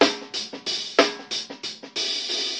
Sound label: compressed snare and ha, 92 bpm; 091 to 100 bpm